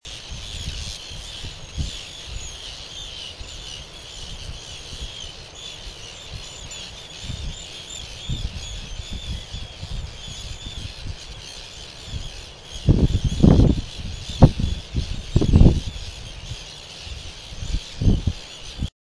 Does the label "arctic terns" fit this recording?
Yes